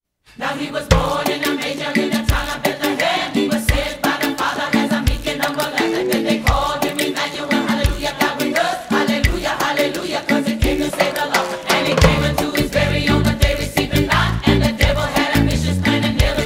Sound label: chant interlude